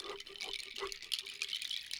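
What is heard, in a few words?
Music Village Calm Near; from dust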